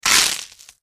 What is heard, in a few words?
MedicalScientific; Soundfx III